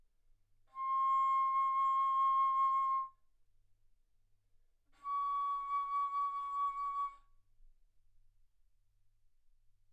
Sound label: Bass Flute ff C6 Db6